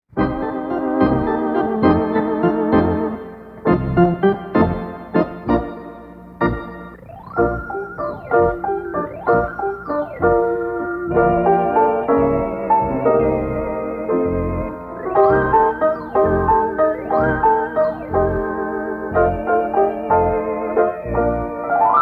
swing instrumental

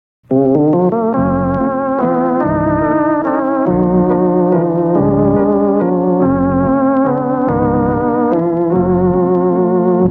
happy waltz (instrumental)

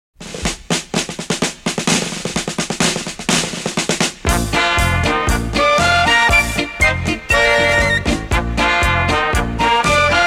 tip top quadrille (instrumental)

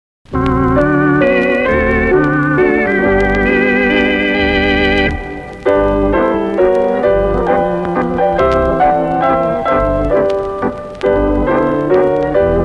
waltz (instrumental); 5 part singing quadrille part 5

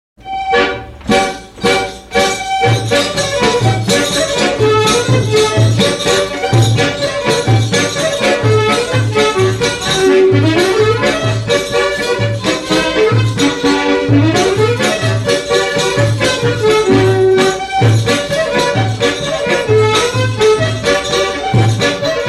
instrumental

